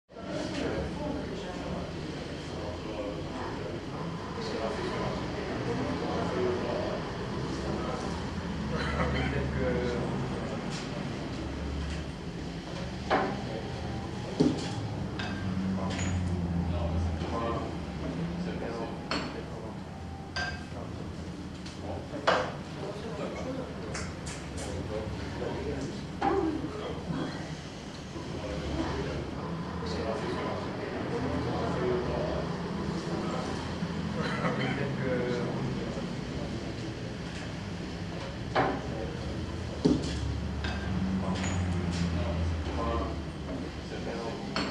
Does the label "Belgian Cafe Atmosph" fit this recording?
Yes